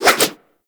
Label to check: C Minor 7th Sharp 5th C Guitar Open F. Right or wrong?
Wrong